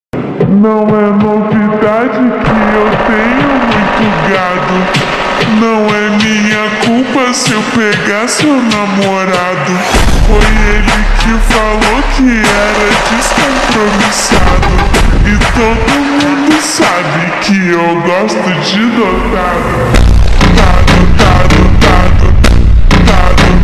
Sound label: phonk br slowed reverb